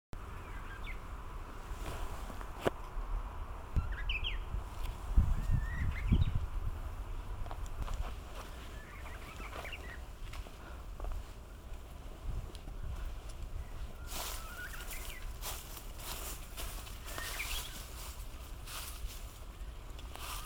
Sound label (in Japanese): uguis